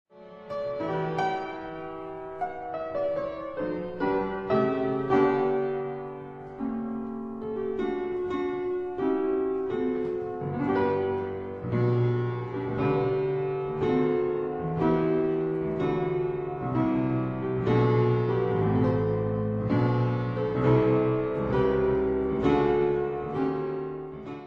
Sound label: Keyboard Sonata in E minor, Adagio espressivo assai